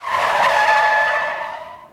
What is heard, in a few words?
police skid; Blind Drive